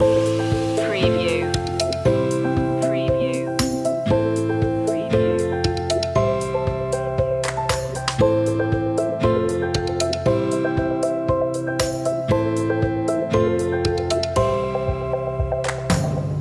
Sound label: travel the distance loop